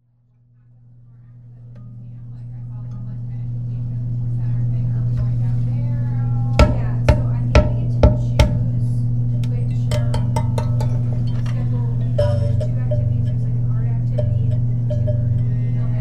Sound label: Kalimba; A Different Universe Lowell Poetry and Song 2025